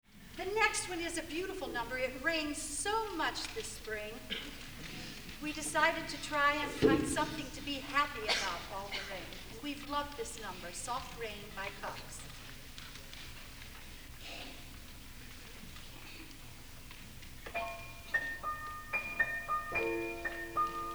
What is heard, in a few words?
Soft Rain